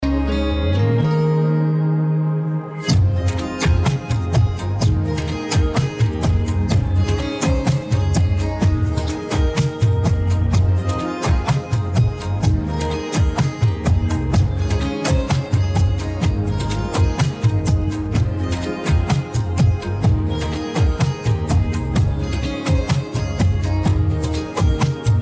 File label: Karaoke Song